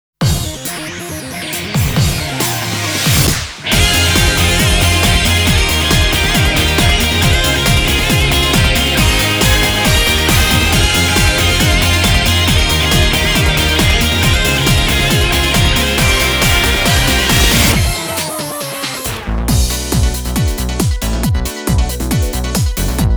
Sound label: off vocal; Higurashi no Naku Koro ni Matsuri